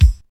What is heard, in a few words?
bassy kick sound c sharp key, steel kick drum